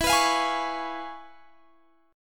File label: C sharp 7th E; C#7/E; strummed